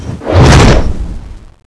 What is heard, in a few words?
charge slash; cso; runebreaker